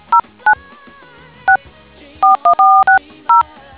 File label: dtmf